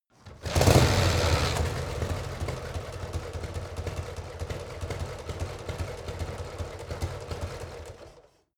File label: motorcycle harley engine kickstarter on off